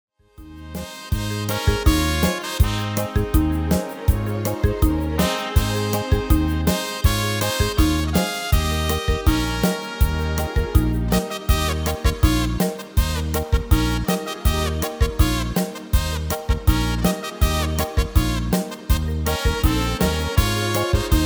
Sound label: MIDI